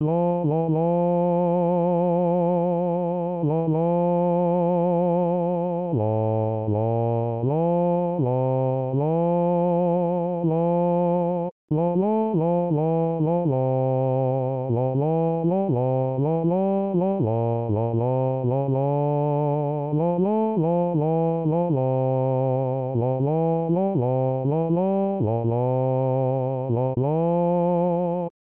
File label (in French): basse; Kousk